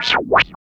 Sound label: STATIC; East West - Phat & Phunky